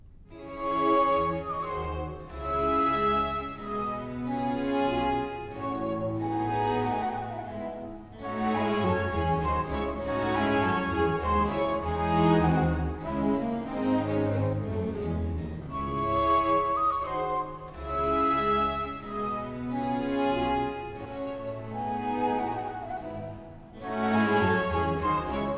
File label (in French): suite d'orchestre, Menuet tendre en rondeau; Prologue; Jean-Philippe Rameau; Dardanus